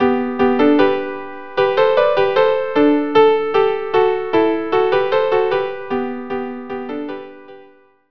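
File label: ronde saltarella violin violin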